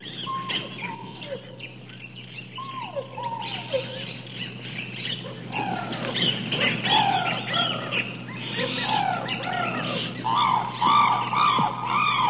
Bliss Hum

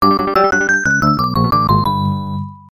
Tournament Mode Win; palamedes; taito l system; arcade

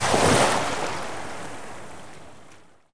Splash

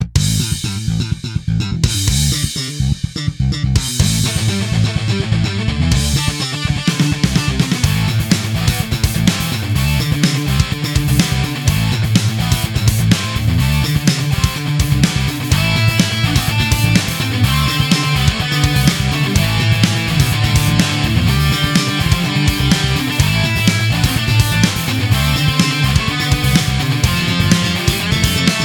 Menu Metal

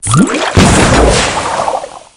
Fizz Burst; Candy Crush Soda

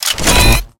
Pickups

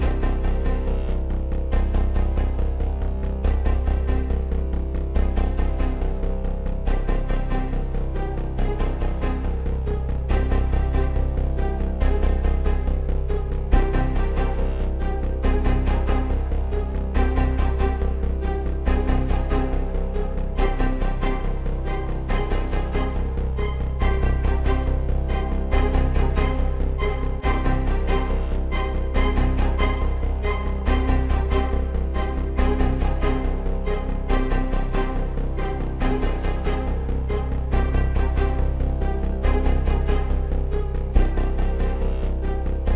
Putting Big; Golf Story

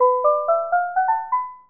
Level Complete; Rayman; Pocket PC

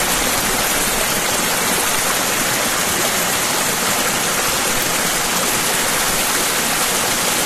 Waterfall Loop

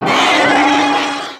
Dragon Die

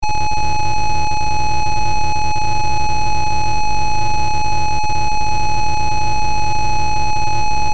Horn With Engine Off; crazybus; genesis

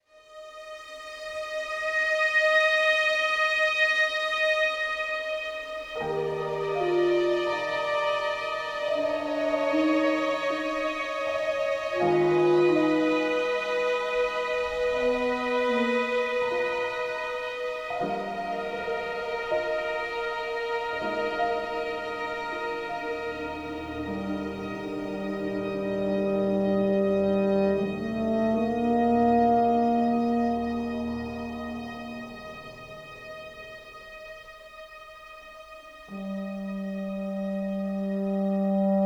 80BPM; LondonRooftop

